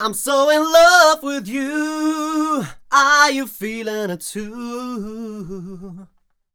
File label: m 128 e-flat, Vocals